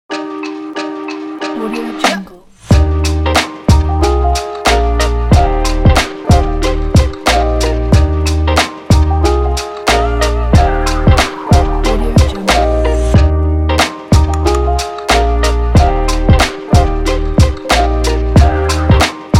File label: cooking hiphop vlog